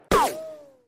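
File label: ricochet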